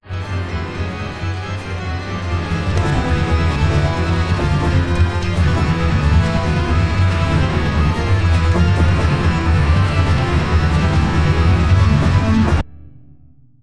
classic music with a sound, cloth

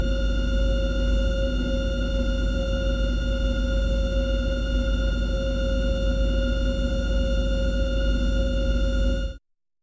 crystal hum high pitched; magical

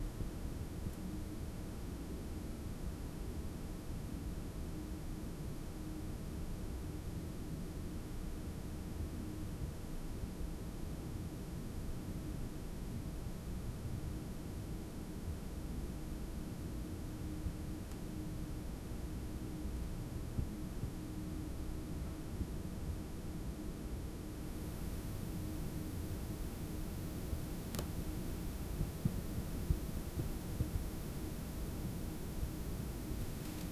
distant hum faint breathi, mystery

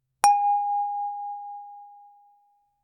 sound effect of a single, glass harp

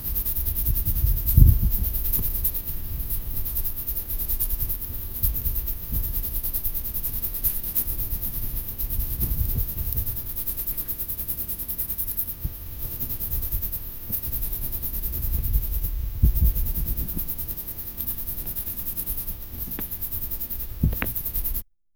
soft and gentle sounds of, textures

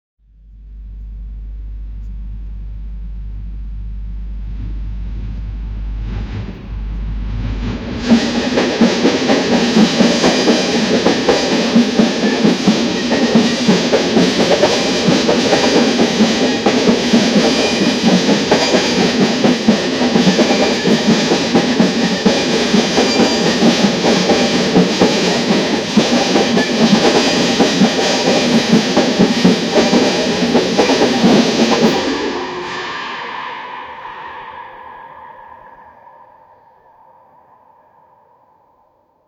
uk train extended s; 1970s

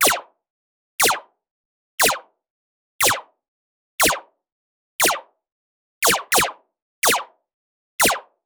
funny short, gun

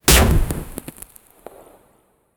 the sound of throwing a, crack

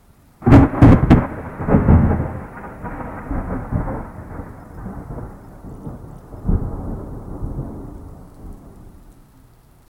thunder strikes multiple

